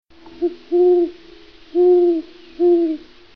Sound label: great horned owl call